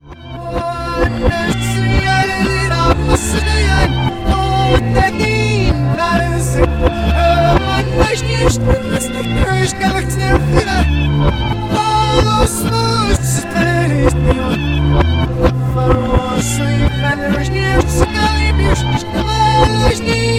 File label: song reverse